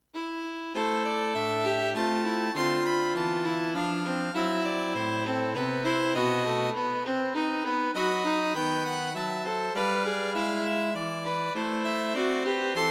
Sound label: String Quartet for Concert performance; This String Quartet bounds along in 3/4 time and the instruments intertwine with interesting harmonies and rhythms.